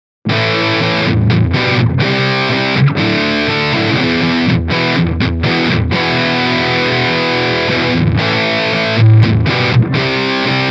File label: Triple Rectifier pur; Amplitube Max Preset